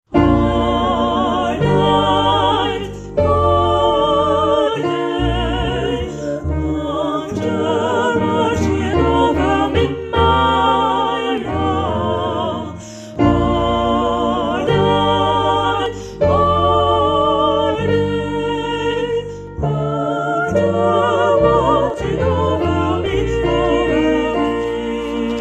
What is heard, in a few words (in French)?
4 voix; All night all day